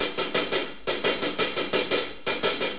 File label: 4-4 HiHat Loop; Drum Tools